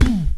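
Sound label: VOX CHEST TOM; RAP CITY 1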